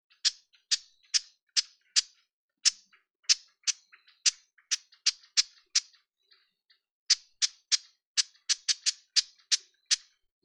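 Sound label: Japanese bush warbler call, birds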